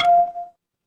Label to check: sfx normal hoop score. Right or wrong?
Right